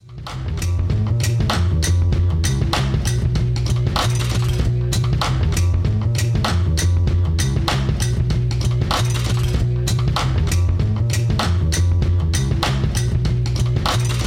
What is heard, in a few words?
gnawa, spiritual jazz